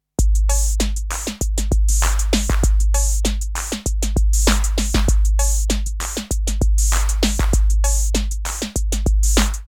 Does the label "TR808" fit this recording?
Yes